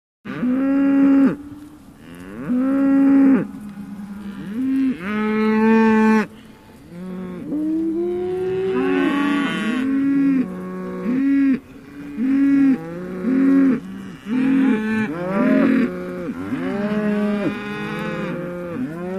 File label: Cattle, Cow Bellow. Group Bellows, With Leaf Movement And Birds In Background. Medium Perspective.